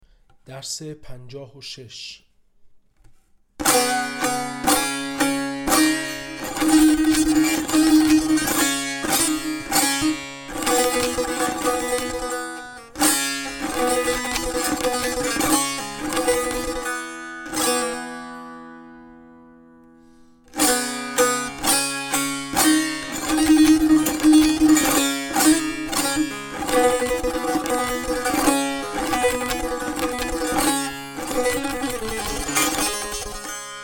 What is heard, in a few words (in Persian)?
مشق تنبور